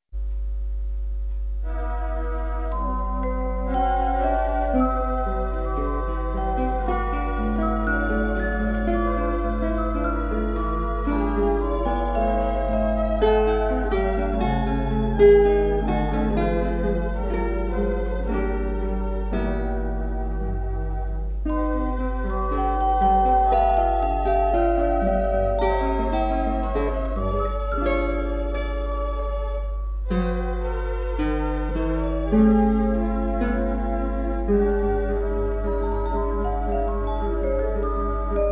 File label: marimba